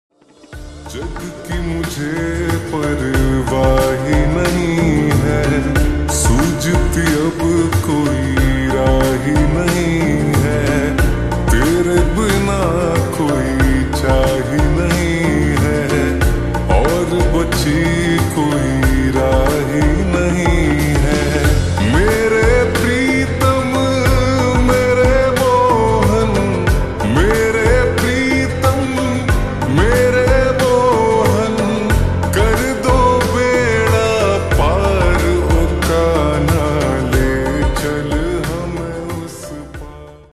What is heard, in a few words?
Slowed Reverb Ringtone